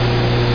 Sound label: machine; SpecOps2 MPlayer Demo